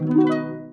rhythm shooting

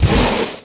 airboat worm attack

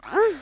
damage archer female

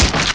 soldier damage

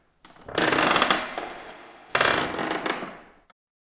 creak

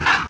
attack; baphomet

